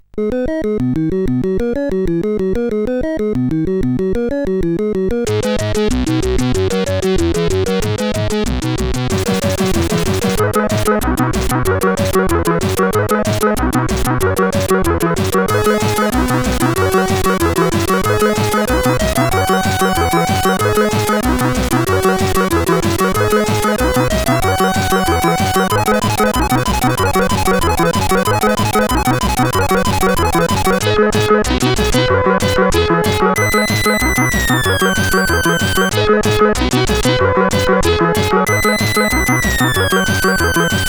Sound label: Ragtime, sid CSG8580R5